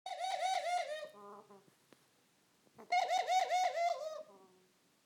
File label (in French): tourterelle rieuse